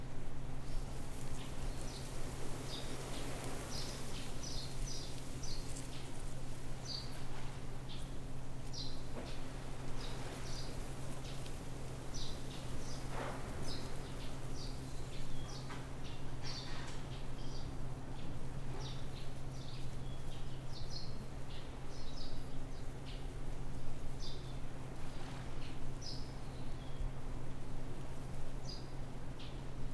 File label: outdoor field morning; anemoi